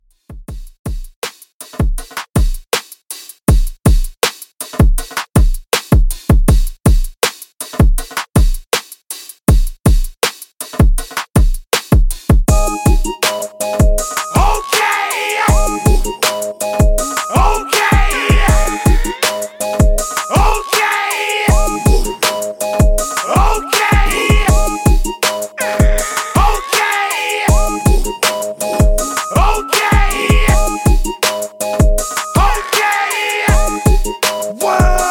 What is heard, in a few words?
Hip Redrum 160Bpm